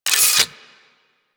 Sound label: Fortress Mine Retract; Ambience; Warframe; Grineer Fortress